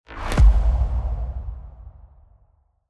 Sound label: Epic Hit; افکت صدای ضربه سینمایی